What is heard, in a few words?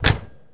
Firebird Door Close; Automobiles